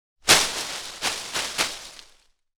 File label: foliage crunchy rustle; prosoundfx